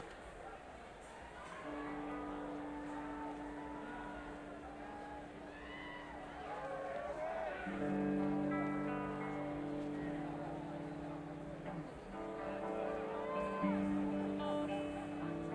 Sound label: Set 1 Intro; mk41